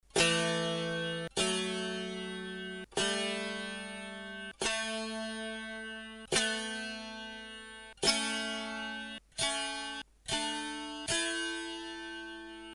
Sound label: Appalachian dulcimer